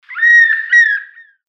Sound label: Stork billed Kingfisher